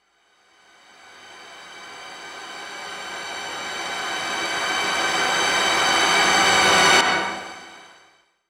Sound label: Hissing Approach